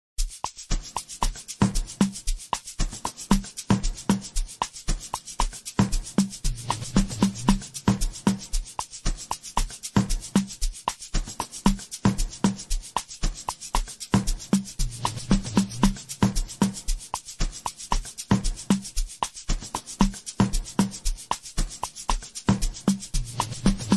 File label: Amapiano